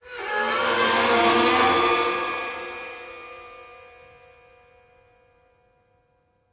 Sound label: Stinger; Legend of Keepers (gamerip) (2019)